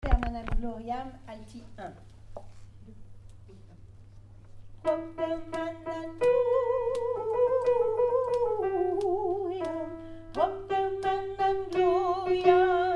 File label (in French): alto; Propter magnam alti 1; Gloria de Vivaldi